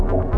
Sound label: Noise; trueSpace 7.6